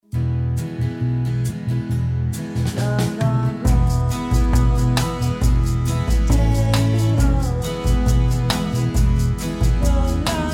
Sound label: Bass